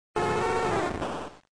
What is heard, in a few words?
vulpix cry